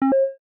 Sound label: Silent mode off Retro, Samsung Galaxy S21